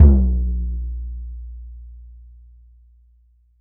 SURDO OPEN, BRAZIL

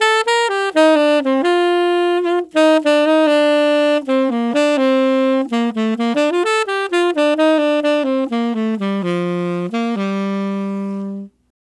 Tenor Jazz Waltz in C min, Tenor Sax Loops; Sonic Foundry (Sony Creative Software); Jazz Solos and Sections

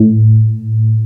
DEF A2, BASSES

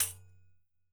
Roland MV8800 percussion; MVPERCUSSION

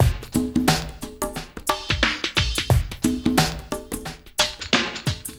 REMIX, SWINGTOMS; Spectrasonics; Liquid Grooves 1 & 2

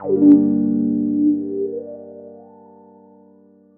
GUI CHORD; EastWest; Dance Mega Hip Hop Electro

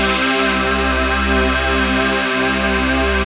SYN TIME, Dance Synth; Sound & Vision - Gigapack I CD 2 (Roland)

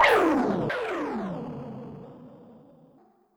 Lasrshot, YAMAHA RM1X

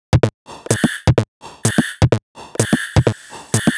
Synth whisperer 127bpm, House Drumloops; 90 s Sample CDs; Zero-G House Fabrik Drumloops; ZG Drumloops

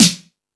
All Snares, E-MU Pro-Cussion; drum machine

